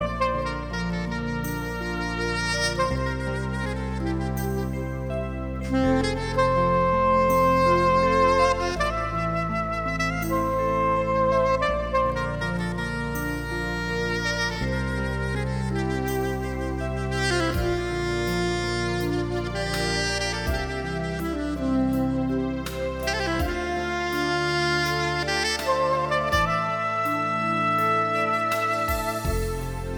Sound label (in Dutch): saxofoon